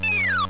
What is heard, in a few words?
fall; GBA; MLSS